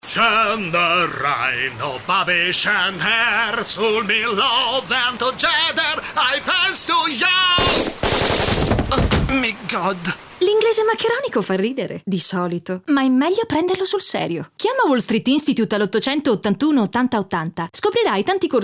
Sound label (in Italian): Pioggia; EXTREME